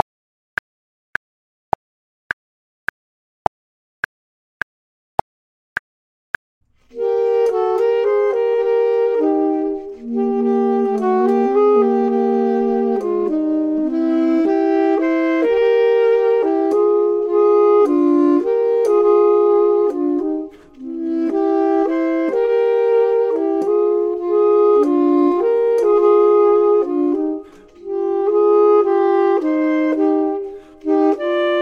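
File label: both, Saxophone and Clarinet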